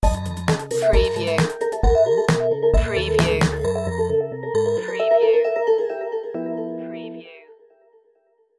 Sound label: saw melt sting